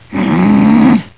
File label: groan; marge; Simpsons